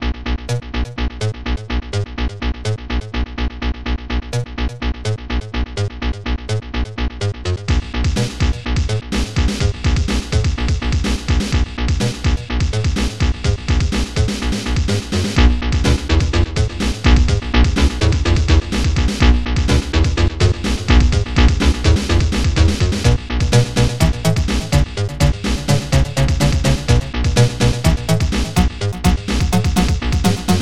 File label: bassline; Sounds Terrific CD; Weird Science; Amiga